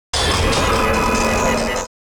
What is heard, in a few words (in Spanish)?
Grito de Regigigas